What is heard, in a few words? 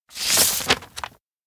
flyer offer; anemoi